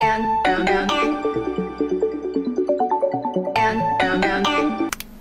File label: Wednesday HUAWEI